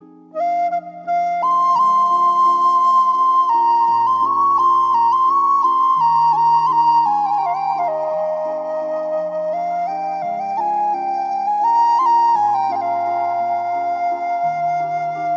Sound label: Malayalam flute